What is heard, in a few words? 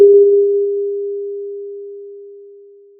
Sound of a church bell